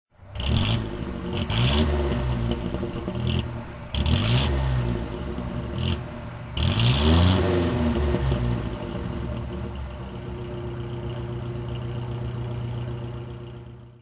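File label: austin seven pearl throttle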